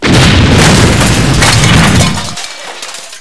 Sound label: bomb hit; pod skyrace